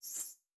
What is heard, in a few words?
Multimedia Internet CD-Rom Flash Short